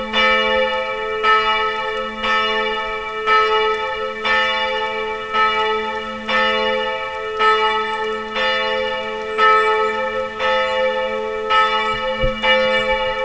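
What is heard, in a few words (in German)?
wildemann geläut, Ein Geläut mit nur einer Glocke; Beispiel-4 Wildemann; Glocke3-Motor; Marienkirche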